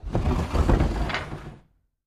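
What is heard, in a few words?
Dumb Waiter Door Raise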